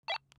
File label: walkie on